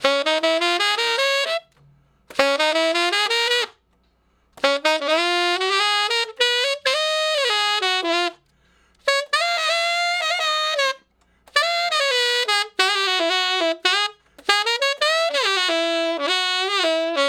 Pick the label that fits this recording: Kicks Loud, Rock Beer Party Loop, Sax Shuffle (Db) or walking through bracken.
Sax Shuffle (Db)